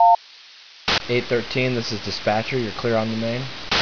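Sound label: Door Close, Train; GP38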